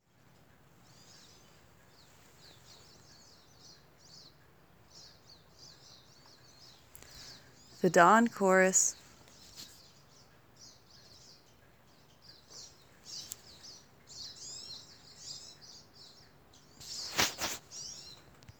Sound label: Dawn chorus